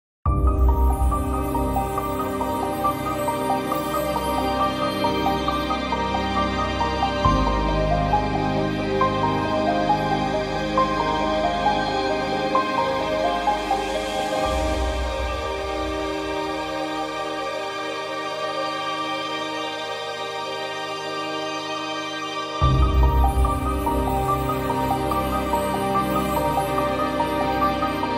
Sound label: Orchestral Arrangement; Donkey Kong Country